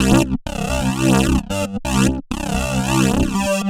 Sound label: Slipslide, Bass; Sonic Foundry (Sony Creative Software); Underground UK House & Electro